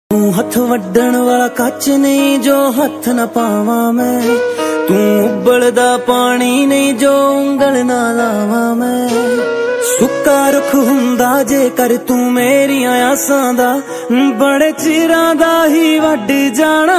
new punjabi song ringtone

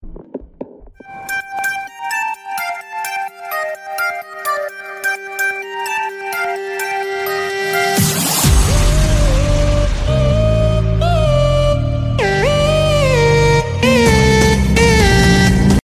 samsung s7 edge ringtone

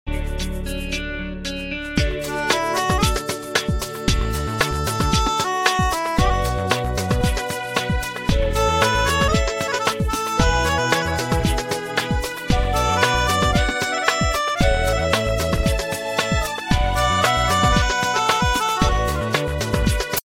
instrumental ringtone

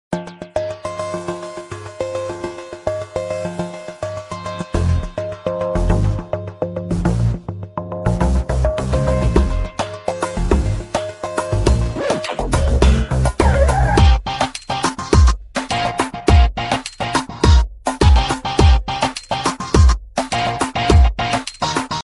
huawei p9 ringtone

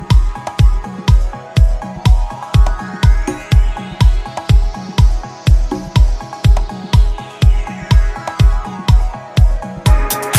remix music ringtone

